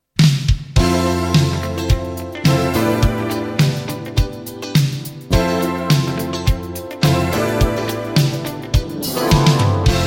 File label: Female J Pop Boogie Funk